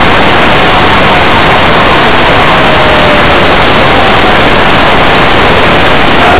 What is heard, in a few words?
disk